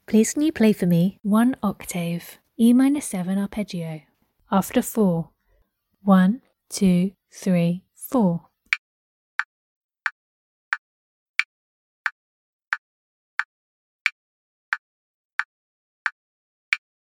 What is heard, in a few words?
e min7 arp